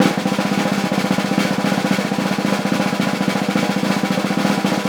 SNR, DRM SNARES

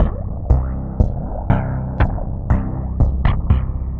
Stepping Bass, Bass Foundations; Sonic Foundry (Sony Creative Software); The Electronic Music Manuscript Collection

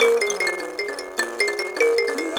Buzz Kalimba; Roland LCDP11 Africa VOL-1

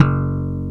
ROCK KIT

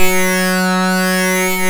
ARP Synths, LFO SYNC F1; E-MU Formula 4000 Series Vol. 3; Analog Odyssey

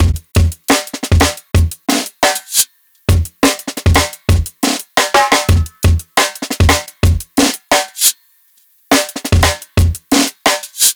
Live Jungle Drum Break, 175; JungleBreaks24

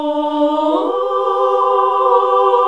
SING, CLASSIC VOX; Best Service; Hallelujah

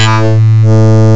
SYNCLAV; CMI Sound Library Rev 1.3

